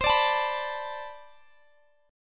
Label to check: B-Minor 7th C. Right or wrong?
Right